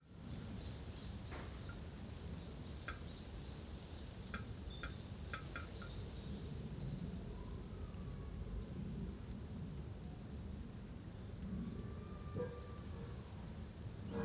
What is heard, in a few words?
wind in tree countryside; aXLsound